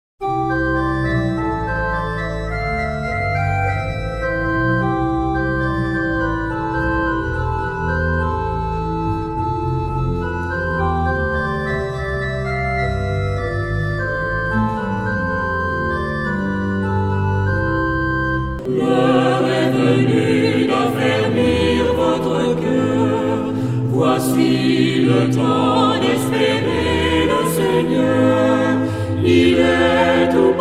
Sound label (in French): Ensemble vocal; Alliance; Chant; J. Berthier